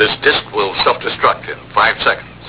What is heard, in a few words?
destruct